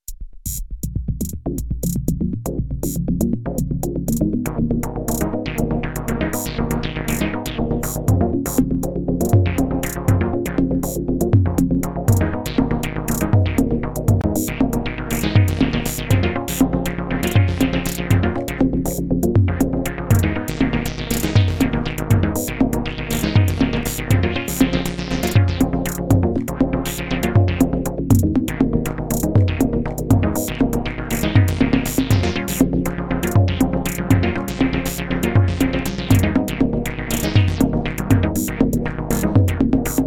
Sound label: modular v and renoise tracker; match made in heaven